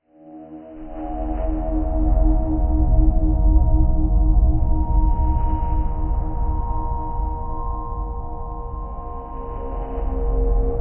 Cave

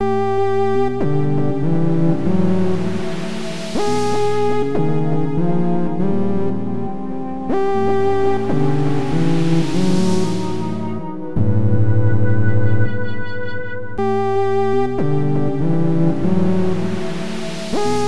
Rolling Waves

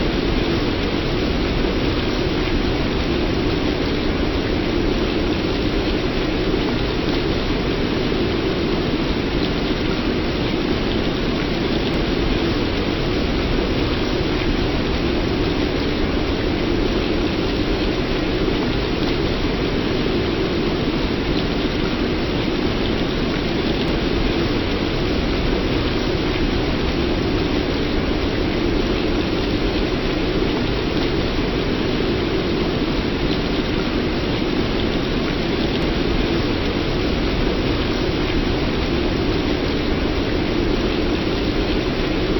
Wind

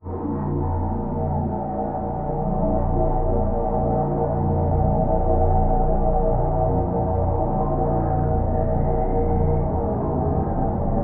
melody

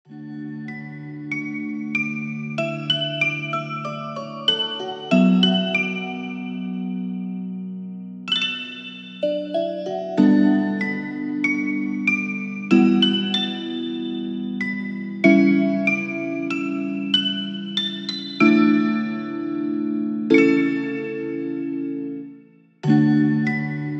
Loop; Fantasy Isle

